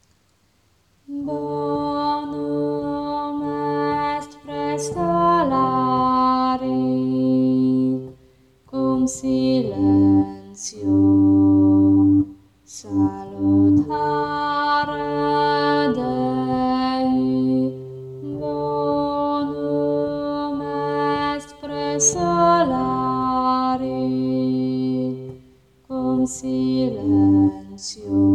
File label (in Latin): bas; Bonum est praestolari